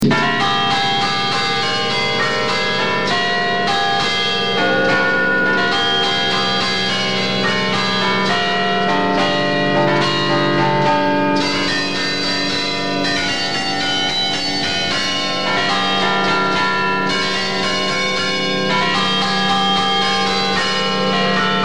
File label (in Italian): matteo, campane